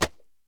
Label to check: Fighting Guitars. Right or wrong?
Wrong